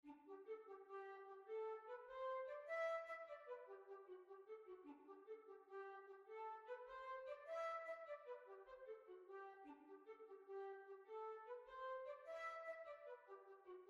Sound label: Jig